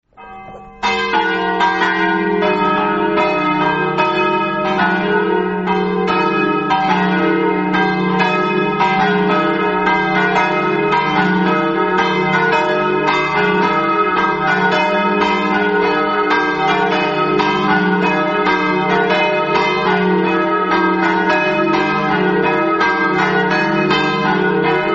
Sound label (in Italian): campane; manzano